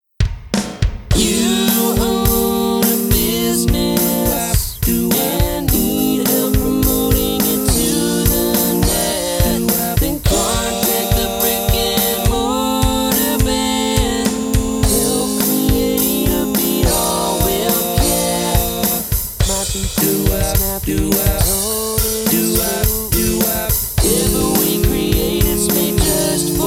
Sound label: vocals harmony